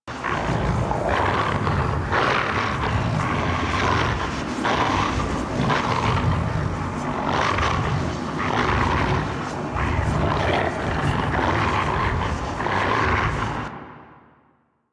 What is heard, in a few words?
Howl Loop Local; Warframe; Voruna